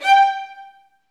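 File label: STR, Roland String Master Series, Violins Marcato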